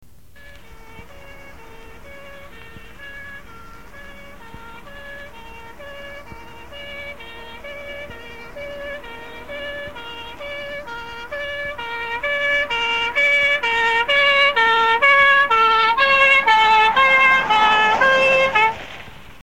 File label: English ambulance approach